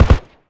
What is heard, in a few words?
polycraft 3 shot far